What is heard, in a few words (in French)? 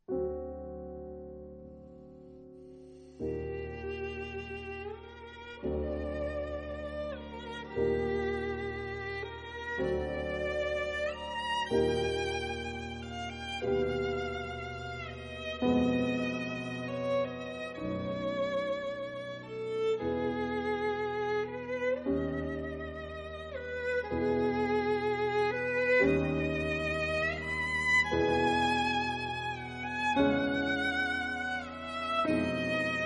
Nocturne violon piano; chambre